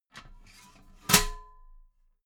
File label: metal ladder small handle fold regular